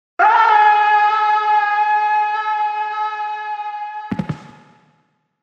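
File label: sounds of a person falling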